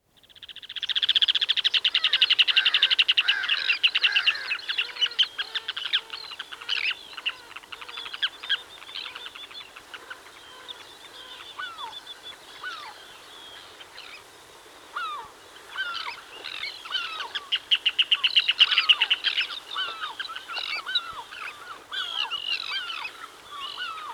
Turnstone

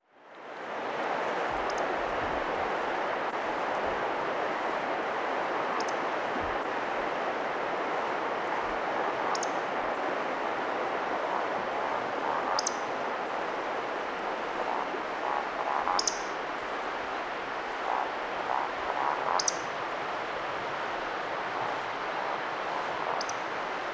Woodcock, birdsong

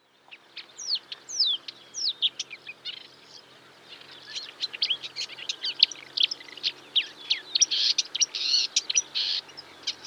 Marsh Warbler, birdsong

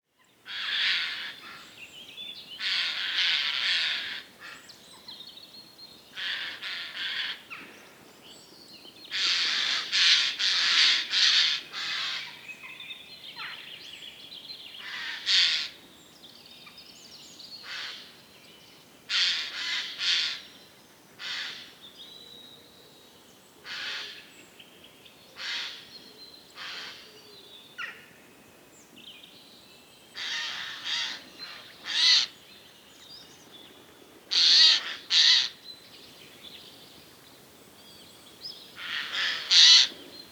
Jay

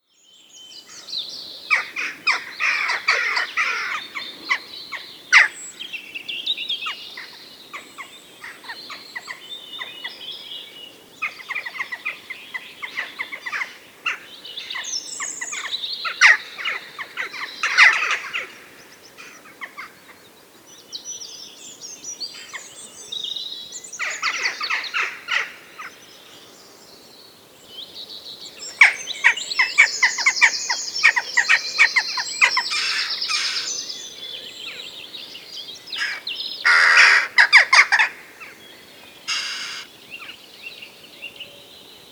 Jackdaw, birdsong

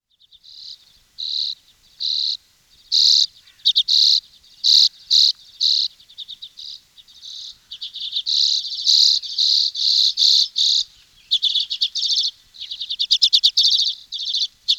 Lesser Redpoll

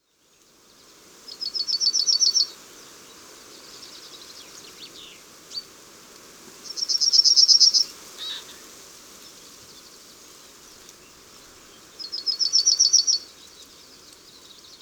Yellowhammer

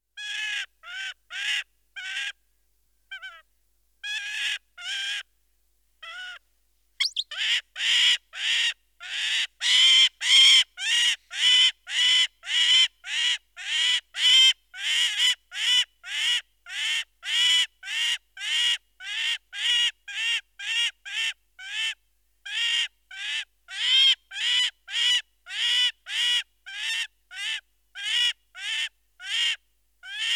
Goshawk

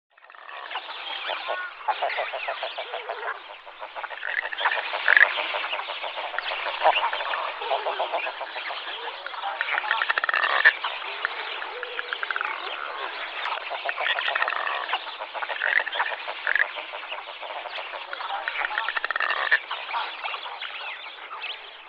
Smew, birdsong

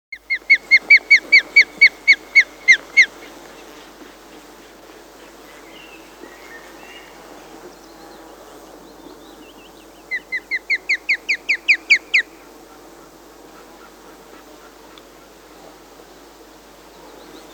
Osprey

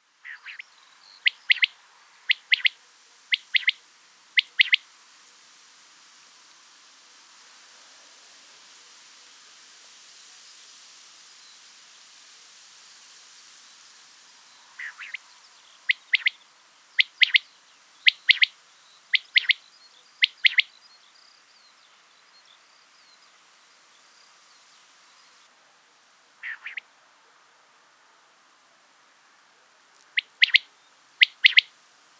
Quail

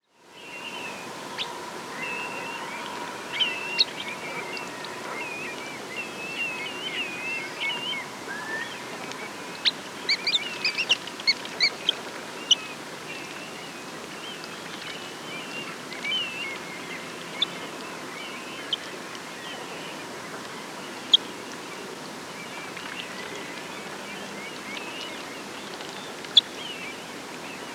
Sanderling

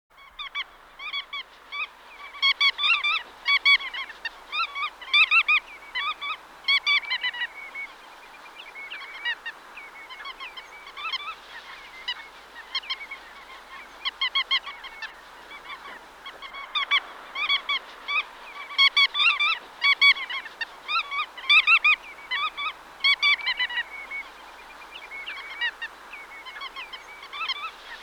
Bar-tailed Godwit

